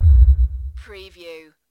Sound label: Distant Giant or Dinosaur footstep (stereo)